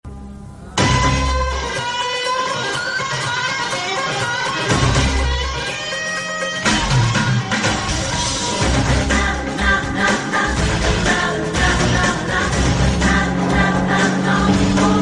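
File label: background music; paiya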